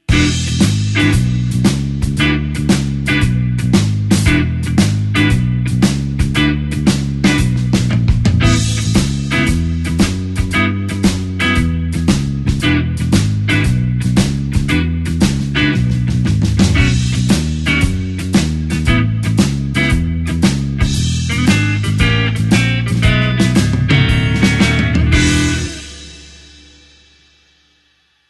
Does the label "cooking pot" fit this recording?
No